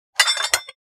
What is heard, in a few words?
Fire extinguisher removing from the wall